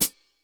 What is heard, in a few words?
ROOM CH, ROOM KITS; Crypton - DrumBase5000